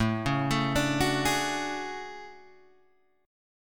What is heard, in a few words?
A Major 13th, down Guitar Standard; arpeggiated; capo=4